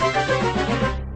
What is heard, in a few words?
down tune; sfx